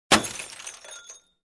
Broken Glass

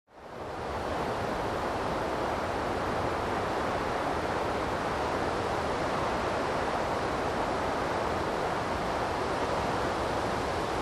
Forest with nearby river

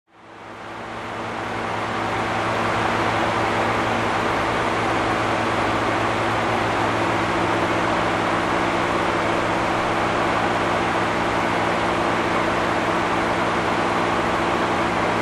Heavy Industrial Machine noise

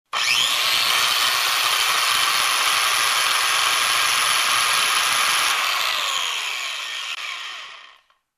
Construction

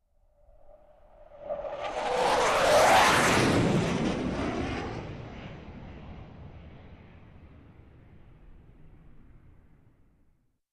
Military Jet Fly over

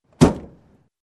Car Door Close

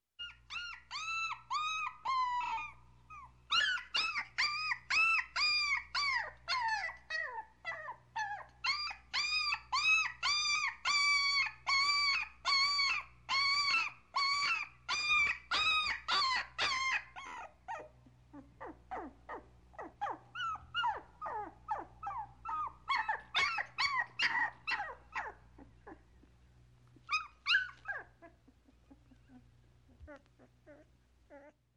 Little puppy whining loud

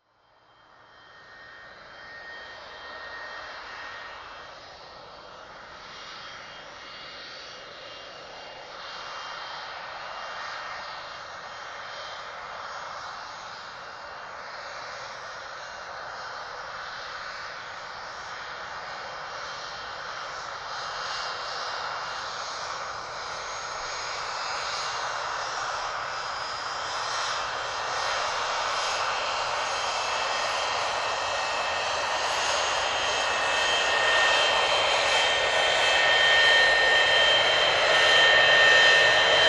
Aircraft Just Landed Sequence

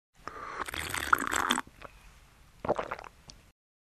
Drink Slurp and Gulp

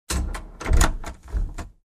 Volkswagen beetle door open and close

